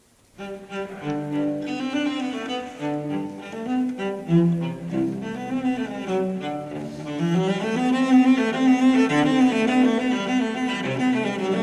Cello Suite; Bach; BWV1007; Rostropovich

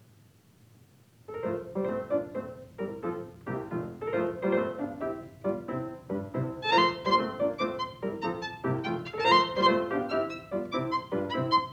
Violin Sonata; Beethoven; Violin Sonata No5 Op24 Spring; Heifetz

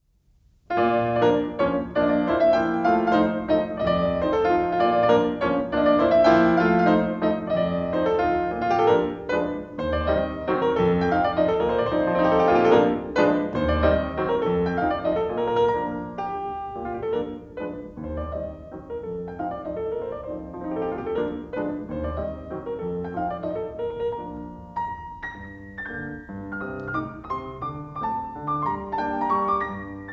Mazurka B-flat major; Chopin; Kapell; The collection of mazurkas

